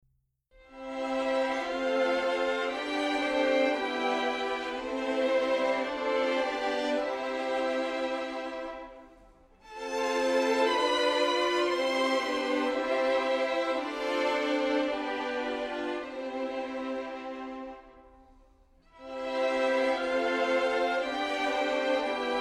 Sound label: Chorale Violins